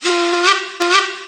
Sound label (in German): D Pfeife mittel